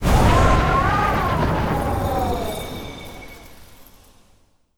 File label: Dis Blizzard